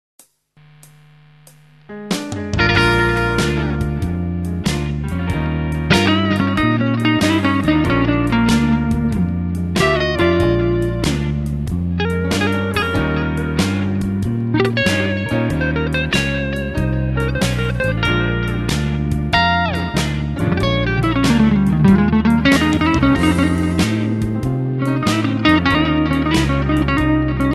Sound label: city limits clean; Tonerider